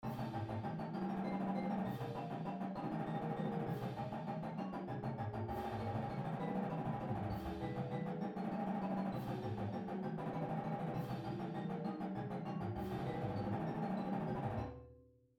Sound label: Triplet Rolls, Drumline; Drumline Exercise; pdlmusic; Drumline Ensemble Series